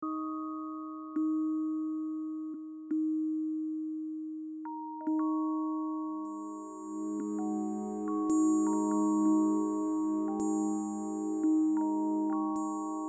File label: musixbox low